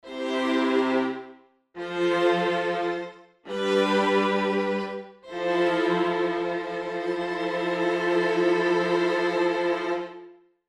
Ensemble Strings Viola small Dynamic Expression Long Lounge Room; Chris Hein